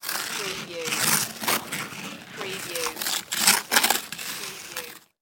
Ice Snow Scrape Wind; Sound Effects Bible

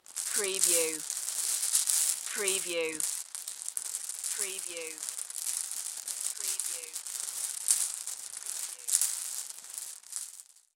Marbles Rattle Long

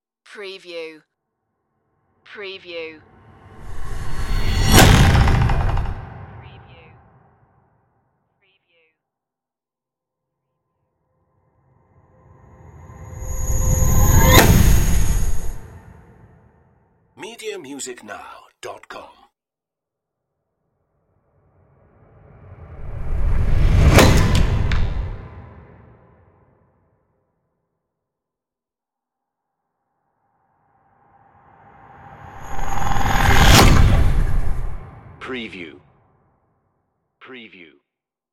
heavy whoosh impacts

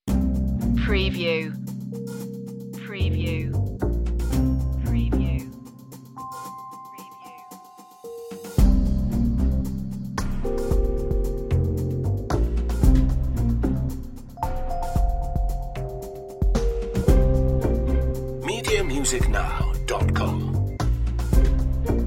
corporate adventures variation

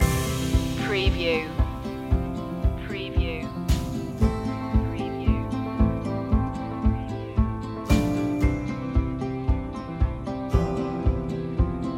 turn that frown upside down loop

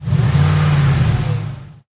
Truck 4.7 Liter Rev Engine Perspective

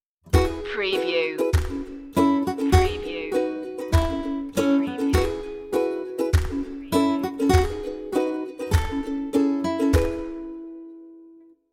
country thing stinger

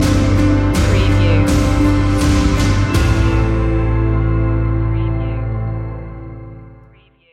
falling down stinger

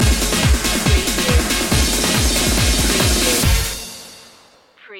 anthem flip

